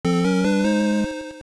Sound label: Learn Spell, Learn a spell (fanfare); AOL